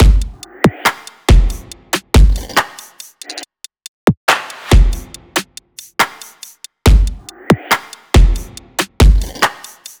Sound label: drum loop 70; Pop; Drums; Full Drum Loops; Slate; SD PASADENA Pop Sample Pack